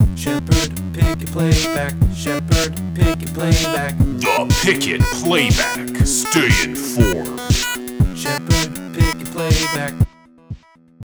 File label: jingle